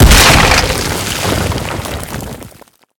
rupture concrete impact; sonniss gdc

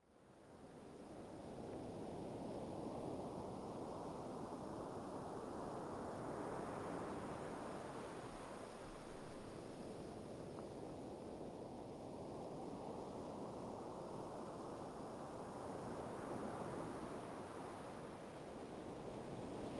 cromer beach 3m from shore heavy waves b format; sonniss gdc

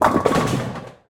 bowling strike multiple pins; fienup; sonniss gdc

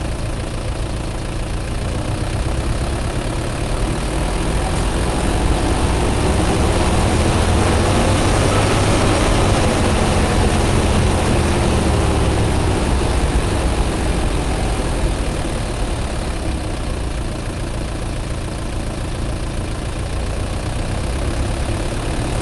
tractor case ii cx90 engine rpm ramps engine off; Sonniss GDC

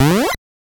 retro video game fx; sonniss gdc

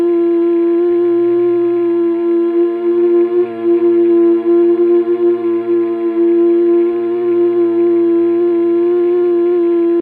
cyberdeck holonoise energy; sonniss gdc

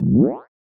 message send; sonniss gdc

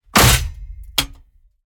metal jolt rattle scrape; fvtt sonniss gdc audio

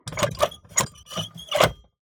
tobacco cutting machine antique crank; sonniss gdc

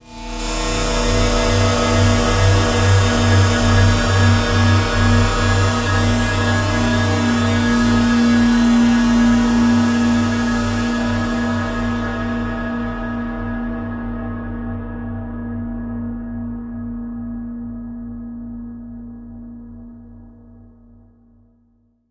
synth texture tonal; sonniss gdc

